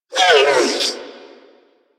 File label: Necramech Repair Drone Idle Surprised; Warframe